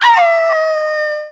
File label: howl; ahlbi